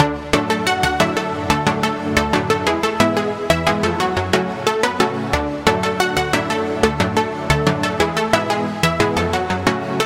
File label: Pluck; Routed Sound; Sylenth1 Expansion - Star Scavenger Vol.1